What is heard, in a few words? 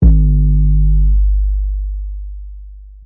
Low 808; drums; single hits; Khezie 808s